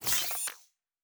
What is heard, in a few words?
Door, Sci-Fi Sounds